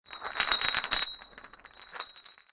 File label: Large Chains Death